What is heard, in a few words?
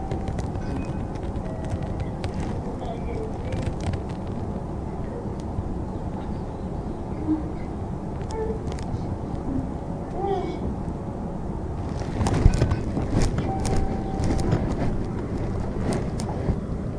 shutter sound; tousatu